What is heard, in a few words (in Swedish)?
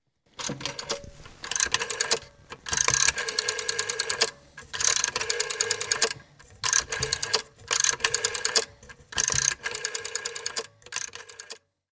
Telefon Fingerskiva; stockholm